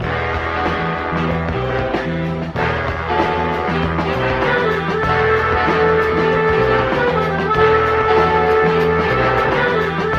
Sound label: horns, phonky loops